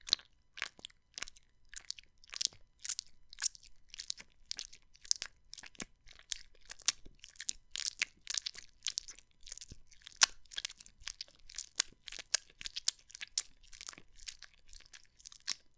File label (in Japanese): ねっとりピストン